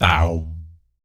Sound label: BS, Vocal BASS FX; ILIO; Vocal Planet VOL-3; Jazz & FX